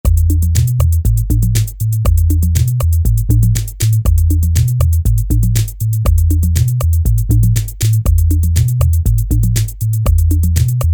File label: Techno